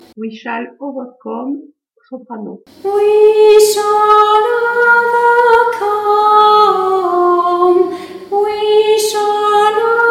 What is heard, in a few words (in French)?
soprano